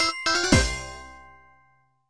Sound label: Player Rankup